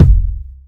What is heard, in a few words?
short reverb kick drum sample a sharp key